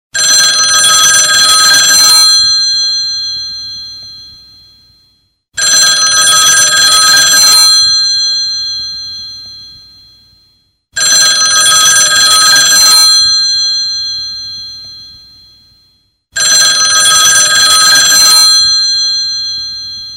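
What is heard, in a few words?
Classic Loud, ringtone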